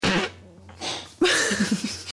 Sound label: bomb exp